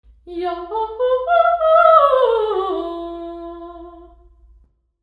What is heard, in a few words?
Hendrix Hall singing